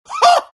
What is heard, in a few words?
laugh; dota; gyrocopter